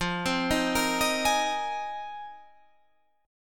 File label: Bb 7th Suspended 2nd Suspended 4th F